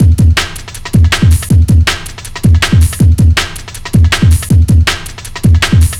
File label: 160, Drum Loops; Total Drum&Bass